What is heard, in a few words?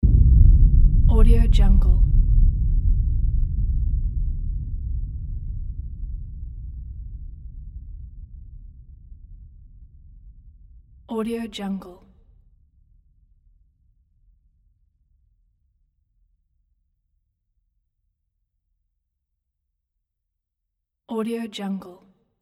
deep cinematic hit